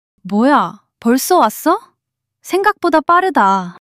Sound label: surprise